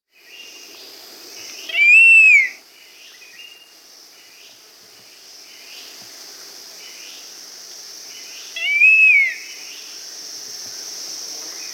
Scaled Fruiteater (Ampelioides Tschudii), Andean Birds; S. America